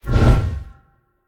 atlas swing; smite; god atlas

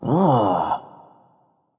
ah understand; smite; voicepack; hou yi

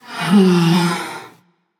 sigh passion; chaac

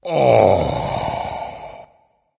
sigh dragon; fafnir

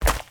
footsteps; smite; god merlin

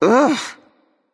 "ugh"; smite; yemoja

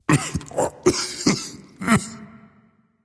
clearthroat; smite; voicepack; xingtian

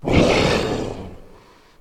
death growl; Smite; Cerberus

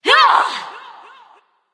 battle cry; smite

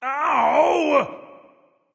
ouch; chronos

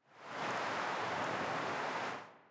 Victory Wind; Smite; Susano

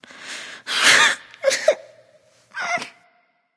boohoo; smite; artemis

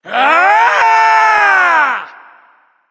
battlecry; smite; hebo

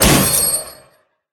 pwr hit; smite; daji

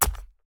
scorpion footsteps; smite; npc

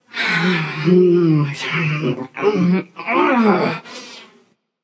grumble passion; chaac

